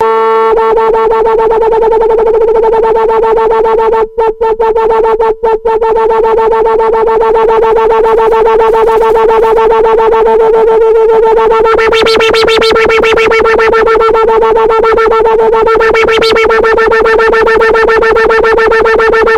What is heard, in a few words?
VCS3 noises